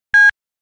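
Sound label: cell beep; beeps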